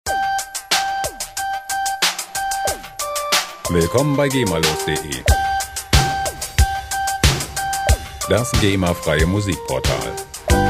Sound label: modern alberti